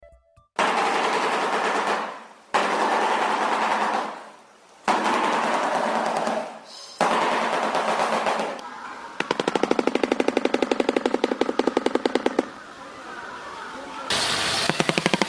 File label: Shoebill Sound